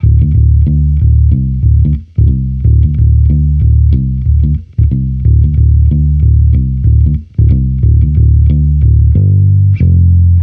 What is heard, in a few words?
Amped loop 92 Dm, Electric Bass Loops; Slate Digital BASSHOLE Sample Pack